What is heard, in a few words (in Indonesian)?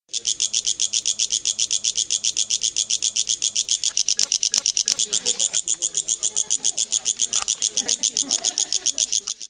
suara belalang daun